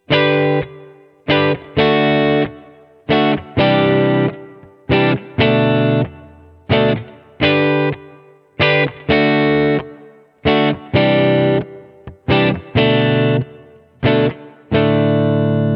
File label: hall; bigsky; hall reverb; Front Row